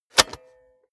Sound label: cassette player slide tray insert medium